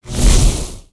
Spinjitsu Lightning One shot

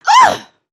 surprised; cannon vo

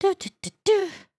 hat dance, emotes

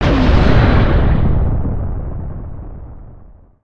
distant blast off; nimbus station

